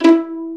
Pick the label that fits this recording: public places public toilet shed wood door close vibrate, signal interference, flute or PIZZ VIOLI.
PIZZ VIOLI